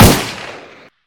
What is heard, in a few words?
gunshots mixed